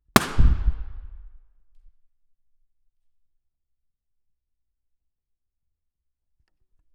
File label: reverb Hall above Stage; Pomona; Bridges